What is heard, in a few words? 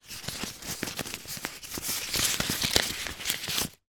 money banknotes australian polymer handling; foley; zapsplat